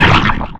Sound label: orb absorb; weapons; dota; medusa